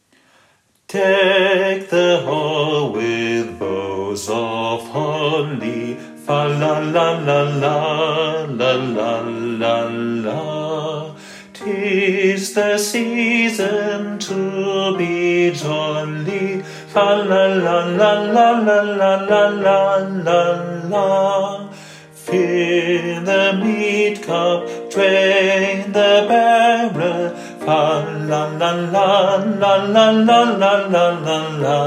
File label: Deck the halls Alt; Popchor